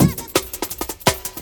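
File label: breaks gated, JUNGLE; M8JUNGLEPAK1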